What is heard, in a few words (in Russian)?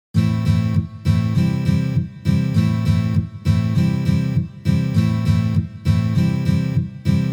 Akkompanement na gitare udary